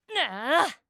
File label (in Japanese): 攻撃